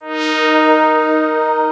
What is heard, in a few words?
Melody Shot D#, dubstep; One-Shots; Melodies; Murk; Ghosthack; Dubstep Sounds 2025